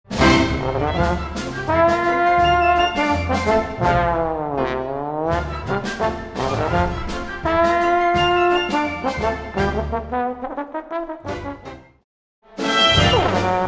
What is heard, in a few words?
That Moaning Trombone